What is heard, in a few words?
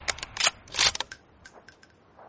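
awm reload sound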